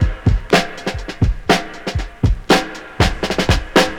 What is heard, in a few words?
120 bpm drum loop e key